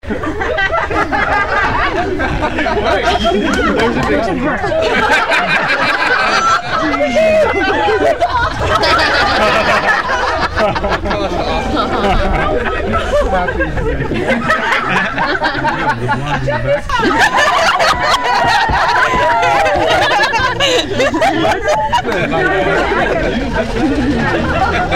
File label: laughs